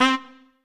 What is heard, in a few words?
Trumpet melo